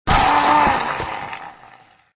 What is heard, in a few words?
Zombie Agony; extra lives; android